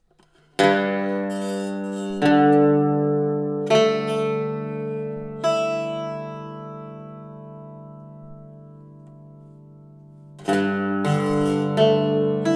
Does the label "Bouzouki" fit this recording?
Yes